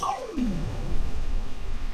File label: whoosh